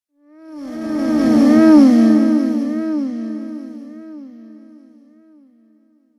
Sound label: Female Space Orgasm, sci fi music